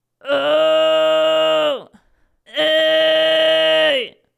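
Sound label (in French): BV regulieres, Distorsion bandes ventriculaires; les effets simples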